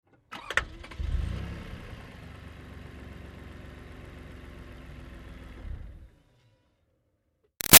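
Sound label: Car Highlander Outboard Weird Start Idle Short; 2005 Toyota Highlander V6; Cars; Vehicles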